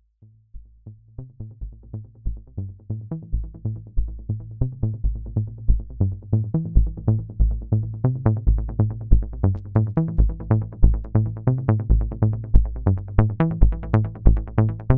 roland juno-106 sounds, arpeggio external fx

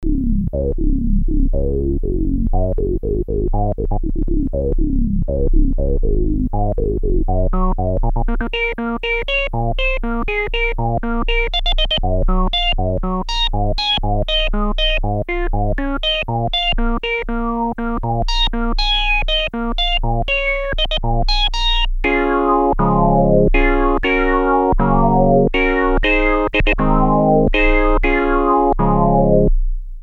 ensoniq mirage dsk-8 filter demo lpf

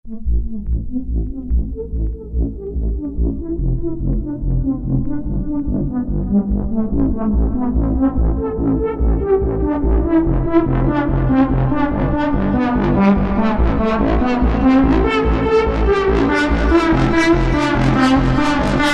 e-phonic invader vsti sounds external fx

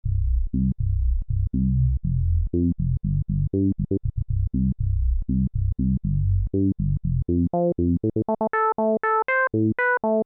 yamaha ex5r filter lpf24d q050

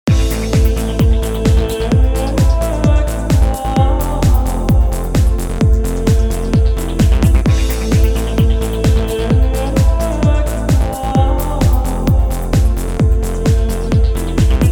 e-mu esi-2000 sounds esi-2000 versus esi-4000

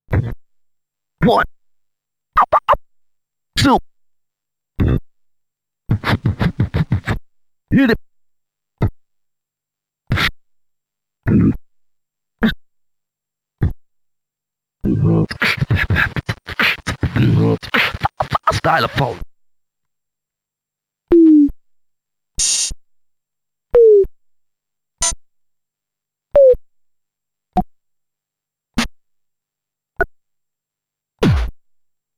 dubreq stylophone beatbox samples

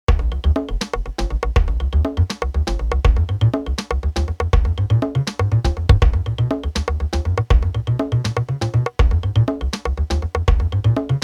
yamaha pss-790 sounds first jam

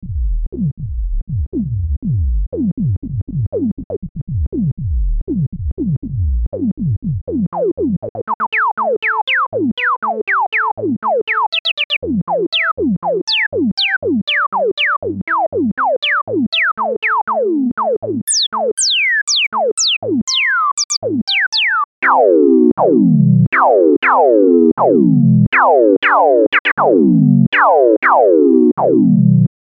synth1 vsti filter demo lpf12; ichiro toda

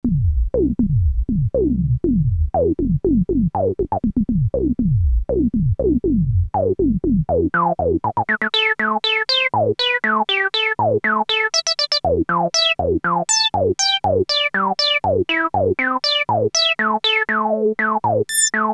korg polysix ps-6 midi filter lpf q075

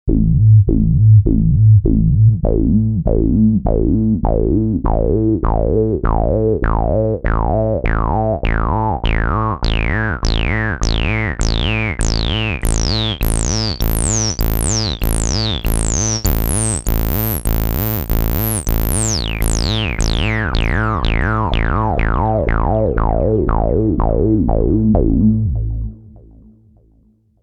casio xw-p1 sounds; first xw-303

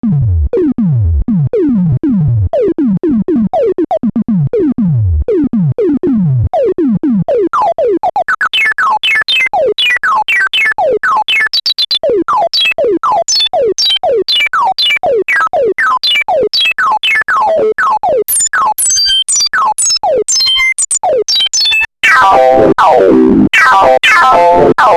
clavia nord modular kb filter demo lpf24 lpf24

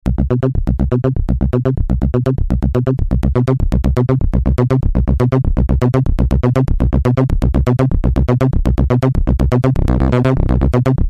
kawai k5000s 303; TB: Kawai K5000S, Additiv pure

